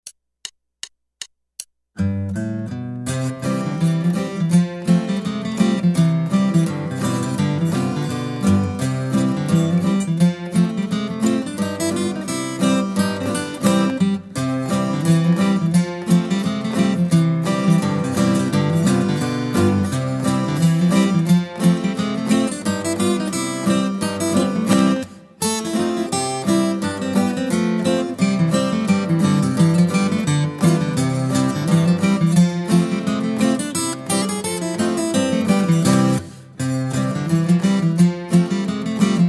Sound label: Guitar